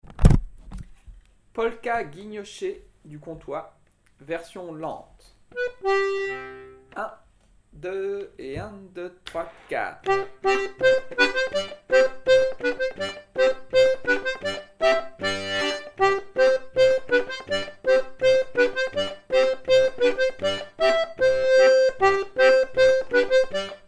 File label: polka